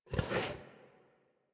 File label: interact stand scratch sniff, Kubrow; Enemies; Warframe; Tame